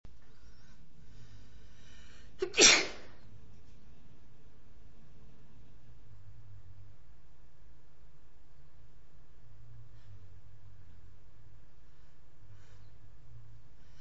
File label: echo, female